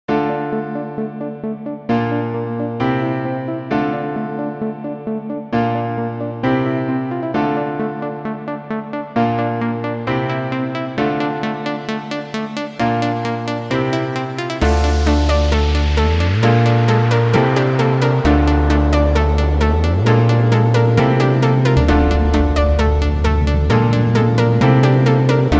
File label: tropical forest יער טרופי, טראק על אורגן